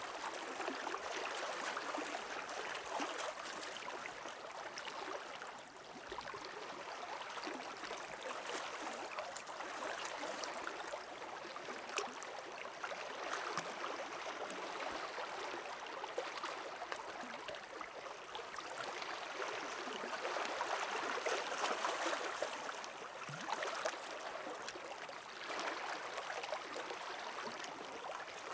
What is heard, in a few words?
outdoor lake